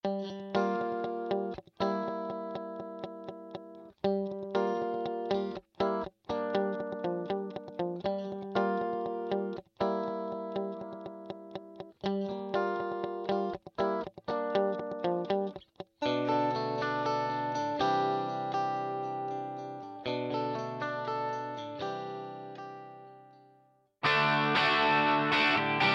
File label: Guitar Dry Vintage Digital; Eventide Model H910